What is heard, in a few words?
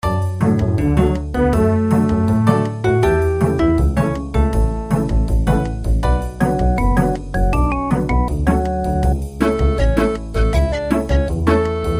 elevator theme; sellbot field office